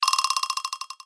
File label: slot turn